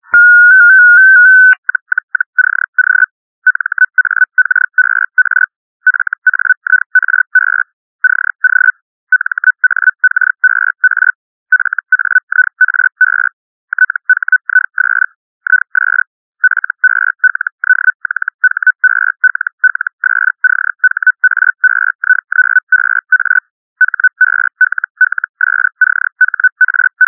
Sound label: Hell, Analog Modes; Hellschreiber; modulation